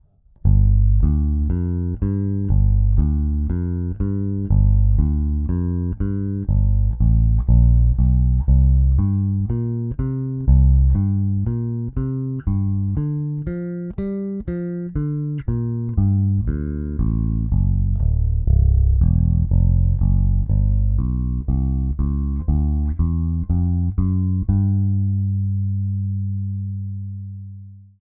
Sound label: AER AMP One Two Three Bass boost